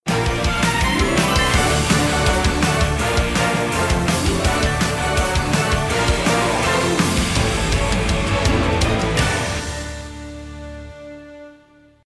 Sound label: Music Island Capture Stinger; Zak Storm Super Pirate; mobile